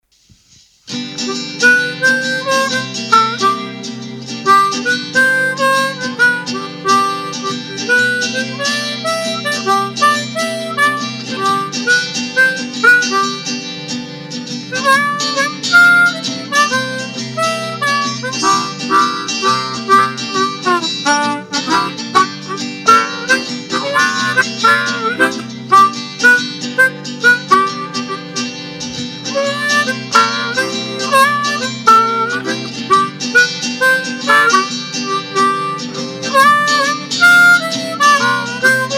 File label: country blues sol